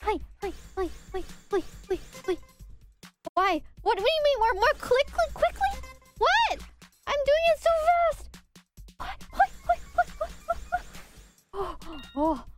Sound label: noises